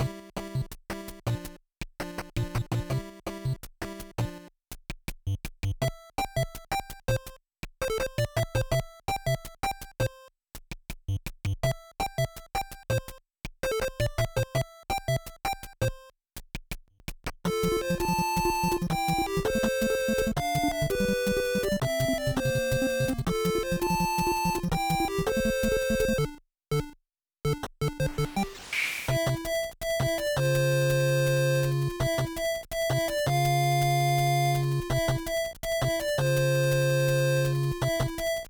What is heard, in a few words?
Title, 8-bit, Hurry Up; super mario maker 2